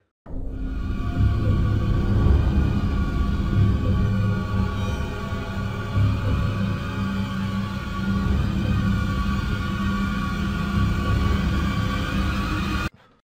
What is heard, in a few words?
Horror Movie Violin